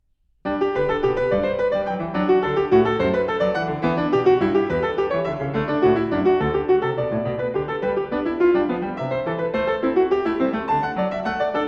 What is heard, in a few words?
Piano; J.S. Bach; Goldberg Variations, BWV 988 (Piano); Variatio 8 a 2 Clav.